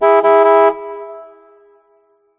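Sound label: Ambience Tennis Crowd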